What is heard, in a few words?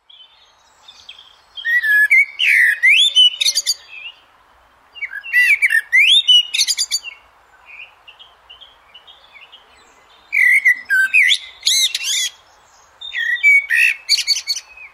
blackbird